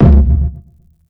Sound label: KICK UNDERWATER; JUNGLE BEATS; ELECTRO KIT